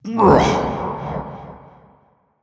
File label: Grunt Attack; Grover; paladins